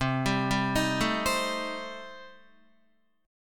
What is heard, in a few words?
C Minor 6th, C, down, Guitar; arpeggiated; JGuitar